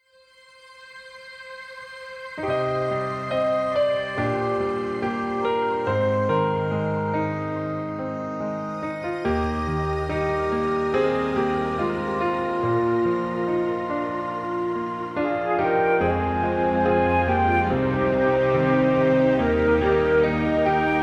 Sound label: Instrumental